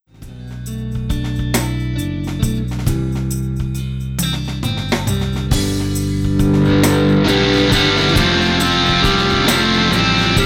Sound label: sound check